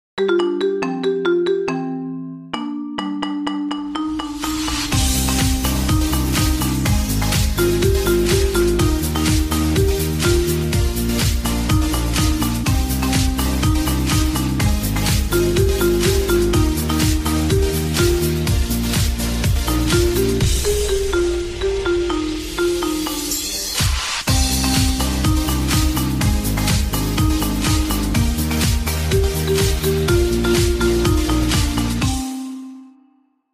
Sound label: Marimba